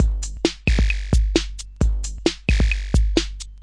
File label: 132 onezap electro, drum loops and breaks